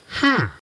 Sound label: Hmm